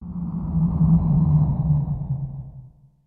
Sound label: Ambient Noise; Super Smash Bros